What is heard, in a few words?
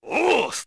win; RedDot